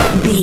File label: retro impact hit